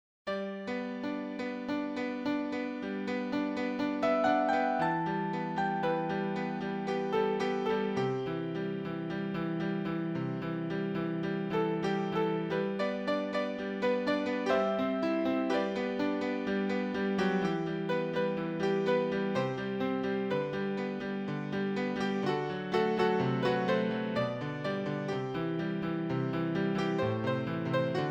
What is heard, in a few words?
Only Piano